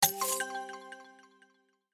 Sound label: unlock